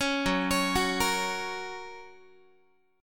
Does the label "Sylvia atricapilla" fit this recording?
No